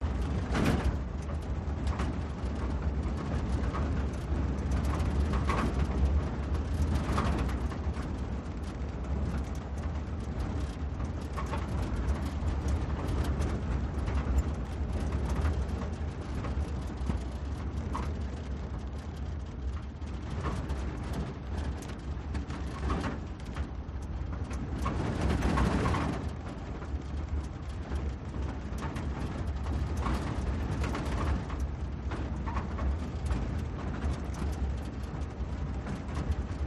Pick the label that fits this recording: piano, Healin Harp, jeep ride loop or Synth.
jeep ride loop